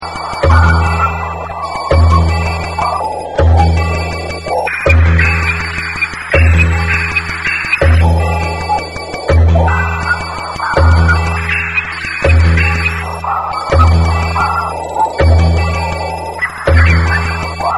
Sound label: sonar timbales